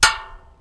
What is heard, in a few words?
metal; Mafie 1